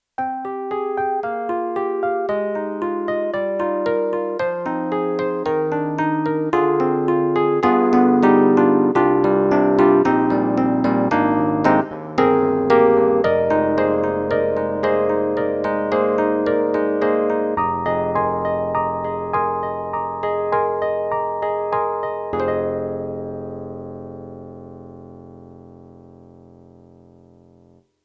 YAMAHA MU1000EX, Old EP

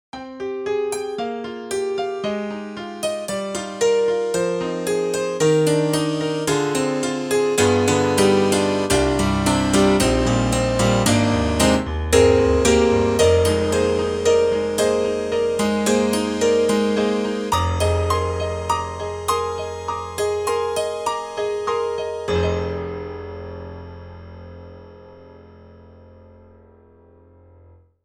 Piano Pickup

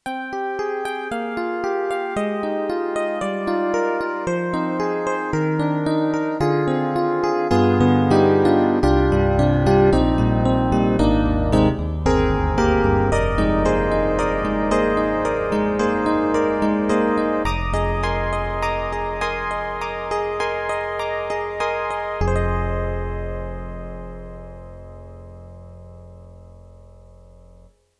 Yamaha MU1000EX DX Hard

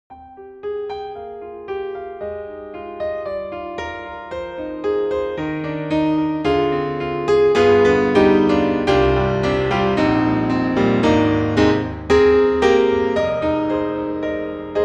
CFX concert grand, contemporary; GARRITAN

